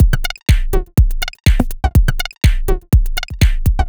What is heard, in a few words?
123bpm, House Drumloops; Zero-G; House Fabrik; Hyve B